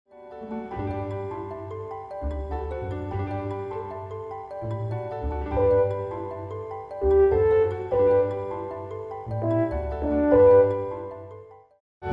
Piano Conc 3rd movt

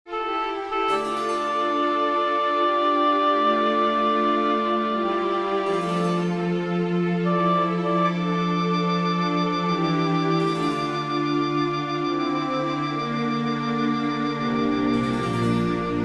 Harpsichord Conc 2nd movt

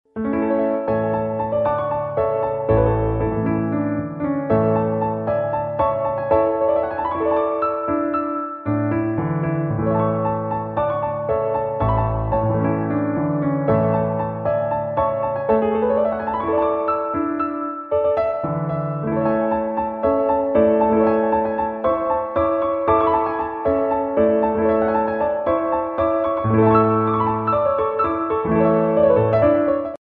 dance for piano

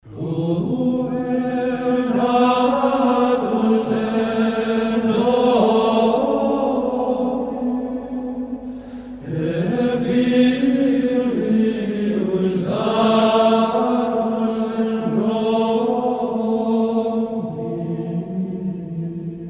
Gregorian chant Monks

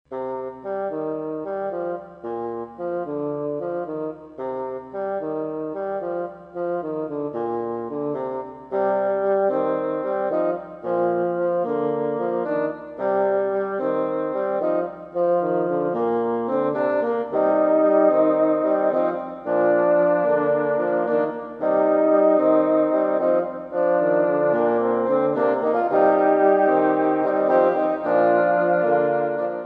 Divisions on Ground 4 bassoons

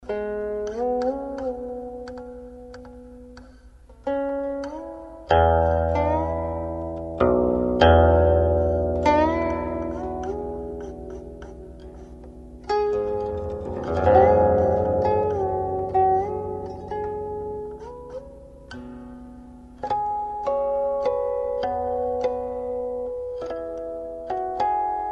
Flowing water guqin

